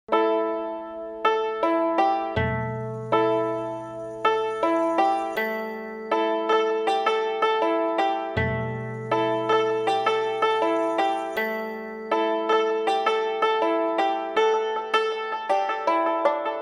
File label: Far East Loop